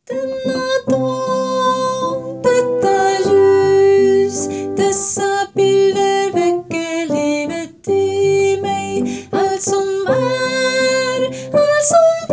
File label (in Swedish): ta emot tenor